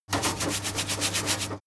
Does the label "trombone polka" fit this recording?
No